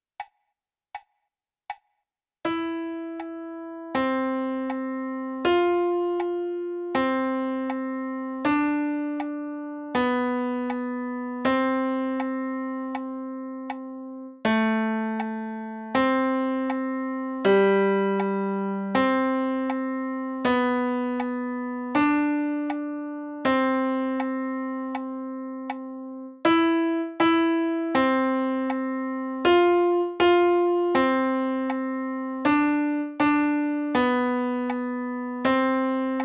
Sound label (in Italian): Pause e Silenzi, 4 note